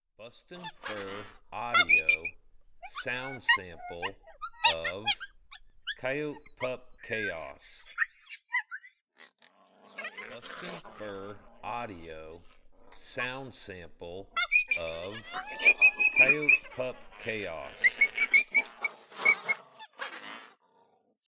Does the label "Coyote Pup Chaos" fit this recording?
Yes